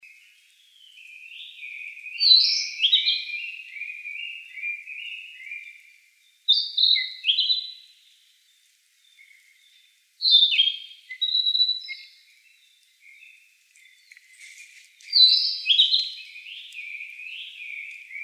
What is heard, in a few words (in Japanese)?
kibitaki gabityou